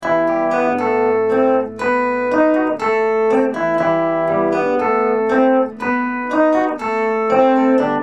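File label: acoustic guitar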